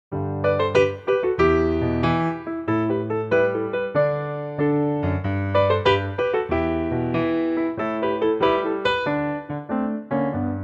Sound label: G piano; key - G - vocal range - D to D